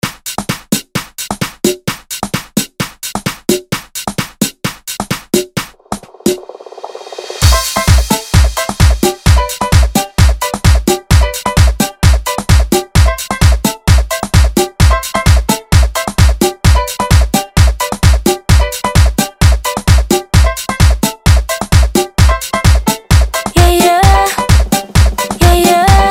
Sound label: Top 40; Redrum